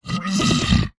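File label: horror monster aggressive short growl throaty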